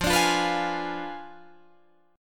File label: F Diminished 7th F down Guitar Drop D